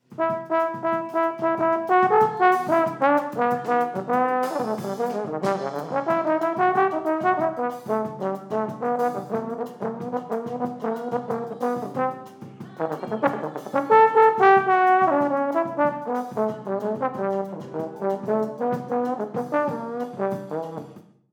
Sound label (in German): Posaune